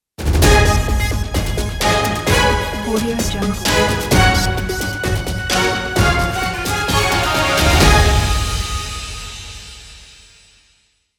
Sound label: breaking news intro logo; raspberrymusic